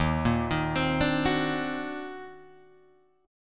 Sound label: D sharp Minor Major 13th, Guitar, DADGAD; arpeggiated